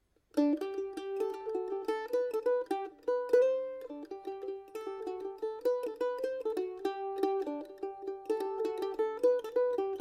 slip jig; Red Raired Marm

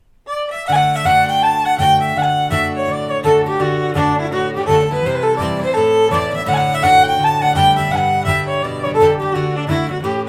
Chief O'Neill's hornpipe, Irish

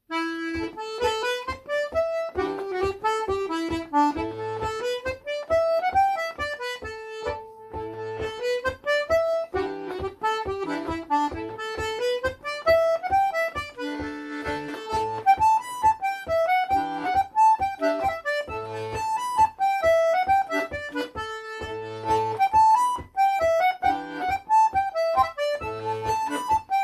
Ballydesmond no.2 polka, Irish

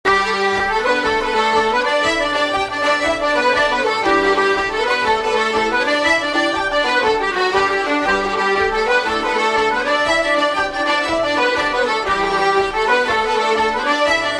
Kesh Jig, Irish; Finnegans Players List of Tunes

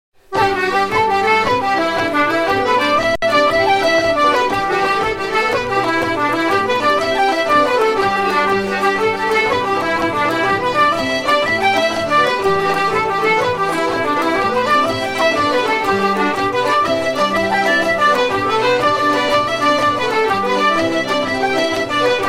Haunted House, Irish

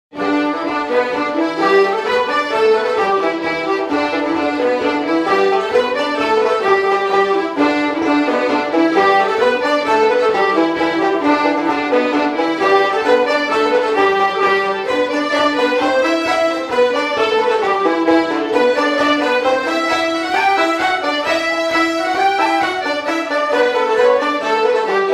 Tulla Polka, Irish; Finnegans Players List of Tunes

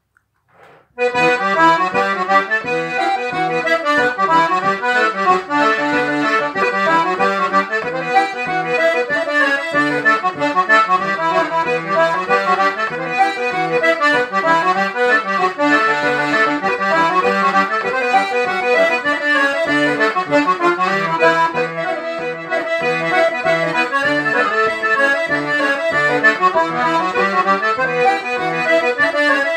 reel, Irish; also know as Pot of Gold; The Maids of Mt. Cisco Reel Set

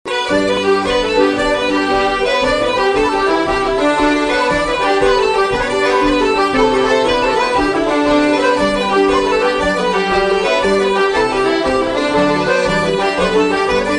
Battering Ram, Irish; Finnegans Players List of Tunes

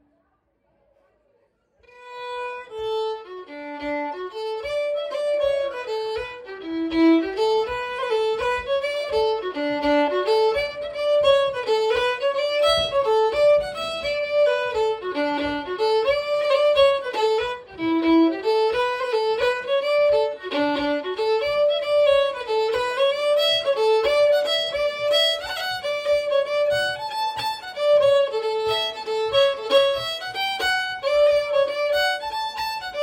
Jig; Shandon Bell Jig Set

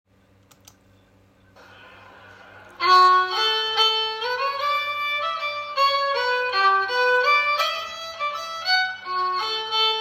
Lakes of Sligo polka, Irish; Finnegans Players List of Tunes

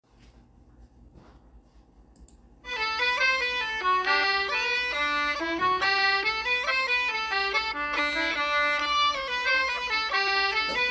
march; Great Northan Bands March; The Ballydesmond march

